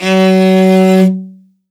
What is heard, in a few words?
L, morin khuur, World Instruments; Best Service ProSamples vol.52 - World Instruments 2; AKAI